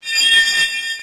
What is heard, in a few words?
Rock Polish; pokemon reborn e19 2022